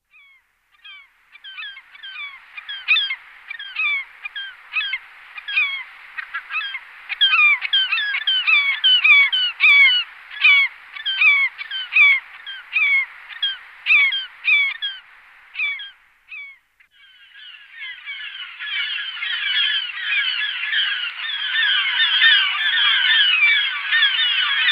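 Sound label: polyarnaya chayka golos, Iceland gull voice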